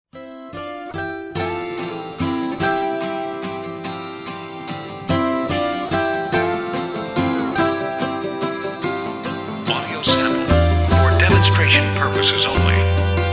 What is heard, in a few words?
LIGHT POP Waltz